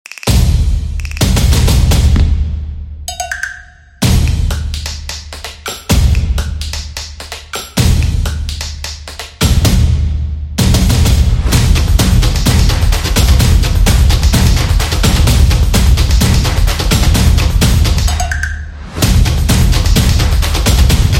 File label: Gopro, sport drums